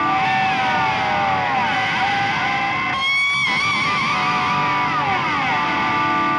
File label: Slide Feedback Wail, Guitars